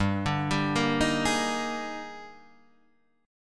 Eb Major 11th G, guitar

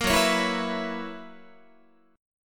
Gb 11th, Guitar

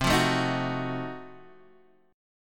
F 7th Flat 9th C

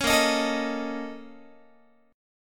A sharp Minor Major 11th, Guitar; 16,18,18,19,19,x; 4A,4E,5A,5D,5G#,6C#; strummed

